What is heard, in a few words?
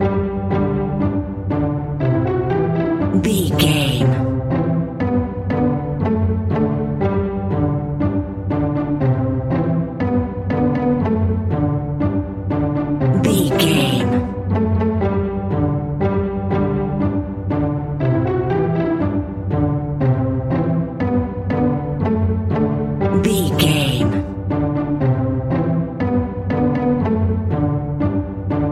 baa baa black sheep pizz strings, Baa Baa Black Sheep Pizzicato